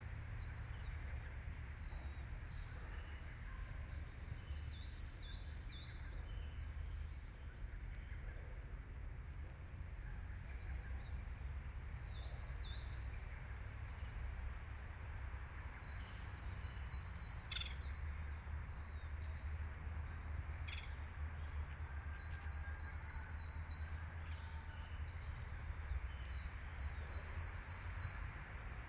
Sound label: yamanakako sound